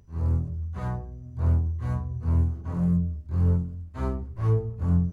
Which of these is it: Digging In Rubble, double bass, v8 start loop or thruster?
double bass